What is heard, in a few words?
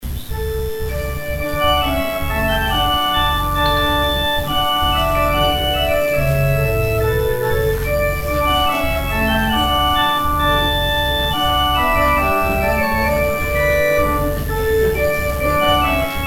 organ interlude